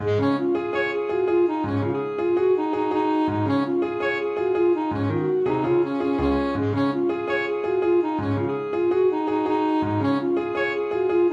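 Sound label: alto saxophone; give us a drink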